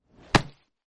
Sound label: Baseball Catch; Ringtone